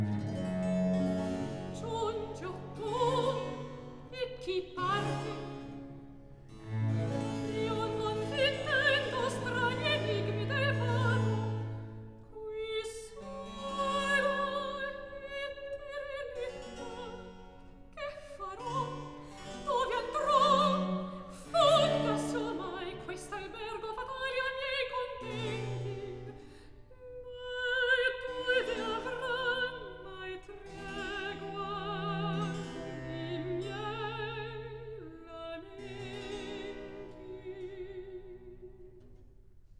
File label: Recit; Act I; Giunge Otton; Handel; Ottone, re di Germania; Ottone, HWV 15